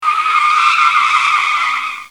Freinage d'urgence

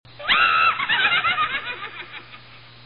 Rire sorciere

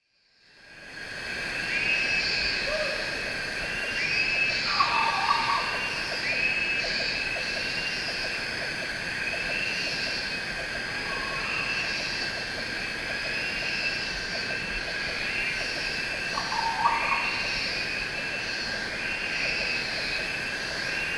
Ambiance jungle

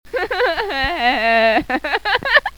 Rire adolescente

